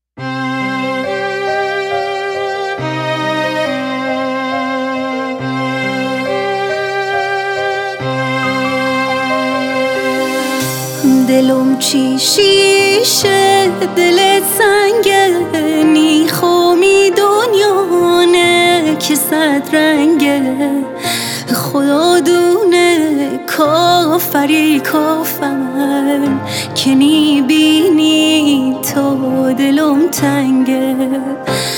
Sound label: lori ghamgin khoram abadi